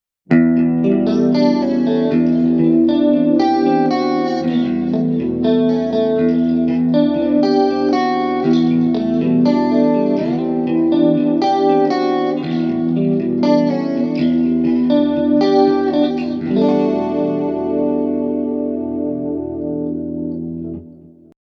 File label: bias chorus clean delay